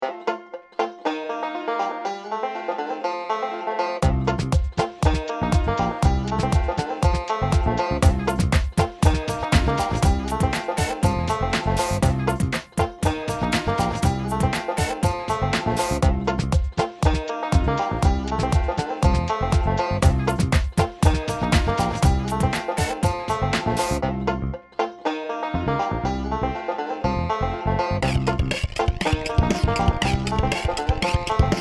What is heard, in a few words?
banjo Jam